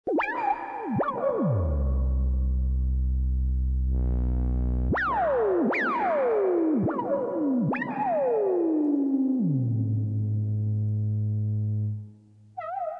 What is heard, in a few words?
Synth